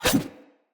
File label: Large Dagger Whoosh Heavy; Snc Large Dagger